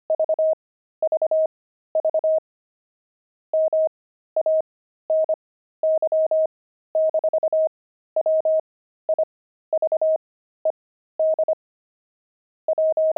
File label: pangram, intermediate pangrams files